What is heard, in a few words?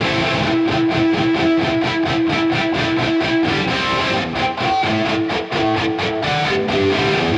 130 A Gtr Metal Textures; Guitar; Zero-G; Future Wave